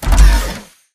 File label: Mvmt FS Land Default; AI Stalker; Titanfall 2